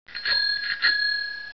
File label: Fahrradklingel Bicycle bell